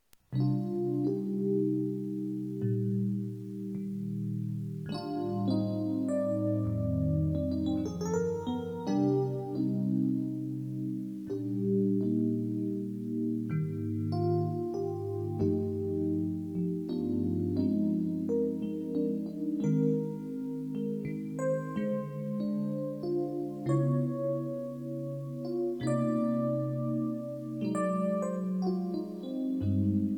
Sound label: blues